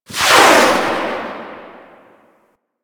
Space Ship Missile Proj By; Warframe; Grineer Pursuit Space Ship

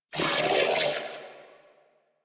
Death Grineer Male; New War; Narmer

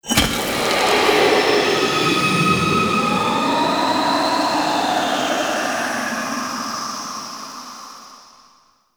Tomb Urn Placement; Mummy Tomb

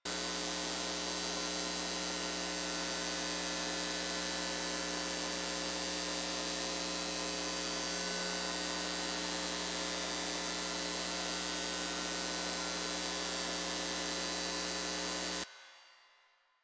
Street Light Loop; Ambience; Props; Warframe; Nineteen Ninety Nine

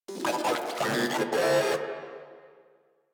Moa Vocal Pain; Enemies; Warframe

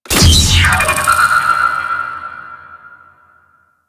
Medusa Button Spawn; Sentient; Warframe; The New War; Sentient Devourer

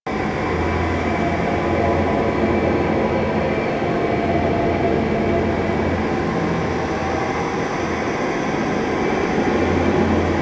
Shipyards Interior Amb; Warframe; Ambience; Grineer Shipyards

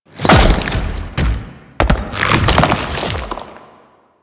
Biped Entry, Enemies; Warframe; Man In The Wall

